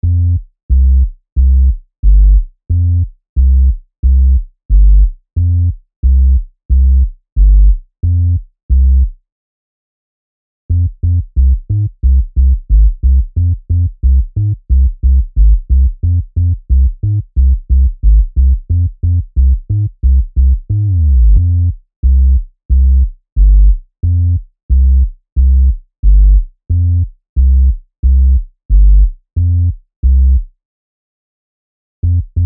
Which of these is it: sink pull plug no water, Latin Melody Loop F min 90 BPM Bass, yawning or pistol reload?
Latin Melody Loop F min 90 BPM Bass